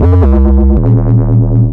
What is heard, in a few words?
bass 138 A#, bass-licks, Techno; instrument-loops